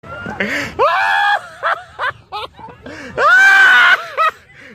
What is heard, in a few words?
loud laughing